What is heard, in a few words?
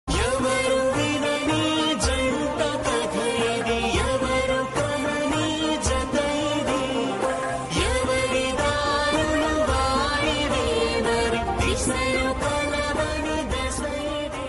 telugu serial bgm; agni sakshi